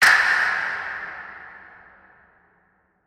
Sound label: designer stutter scream hit; SoundsCrate